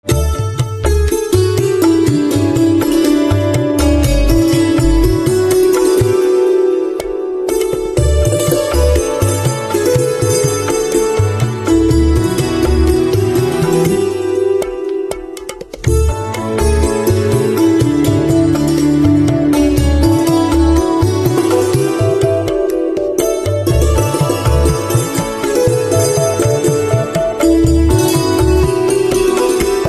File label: Santoor